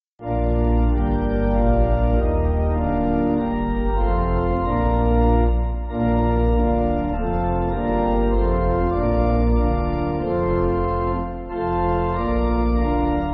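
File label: Pipe, Pipe Organ, Music Backing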